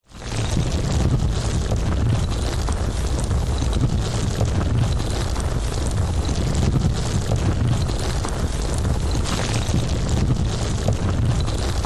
nature rocks landslide boulders heavy low frequency rumble sustained brighter